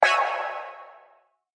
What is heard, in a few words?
Metro Notify